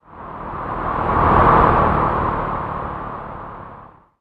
car pass; Transport

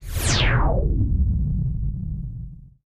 synth sweep

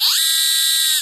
motor mono, tool

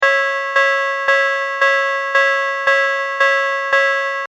train crossing loop; Transport

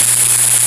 electric; mono

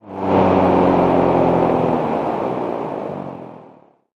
car bypass mono, transport

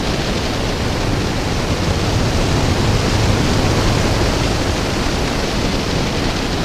storm loop; Nature-Weather

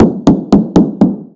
knock mono

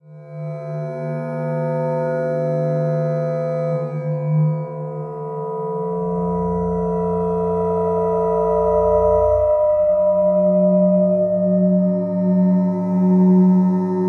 horror, ambience drone

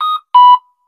signal beep loop; Transport

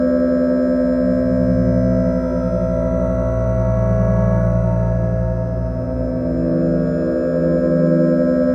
horror loop, ambience drone

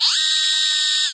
motor mono, tool

